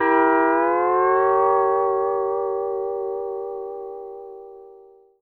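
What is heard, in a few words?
pedal steel chord E dim7 R, Country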